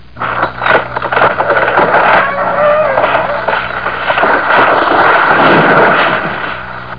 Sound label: Tree Crash; Reperages 94; Director; Vitrine Multimédia